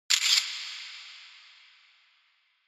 Camera Phone

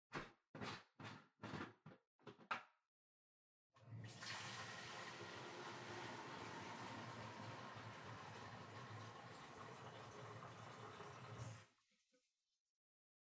Air Purifier Far Tank Water

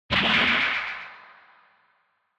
Retro Anime Robot Low Short

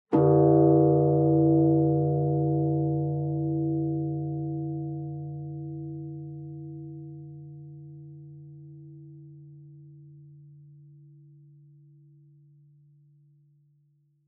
Bonsho High

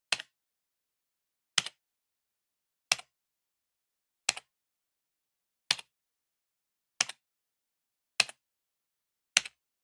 PC-Keyboard (Single-Hard)

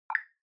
OS Sound (Speaker-Notification)

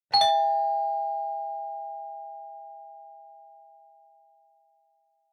Doorbell Mech Tone, Close; ドアベル 機械式 トーン; 近い

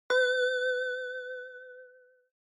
Single Accent High

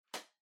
Portable Cassette Far Close

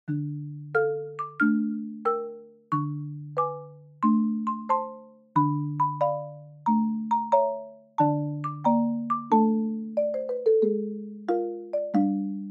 Shuffle; Dance Of The Sugarplum Fairy

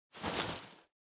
Pocket Watch Pocket Suit Put In

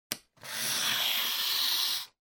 Cutter Cut Paper

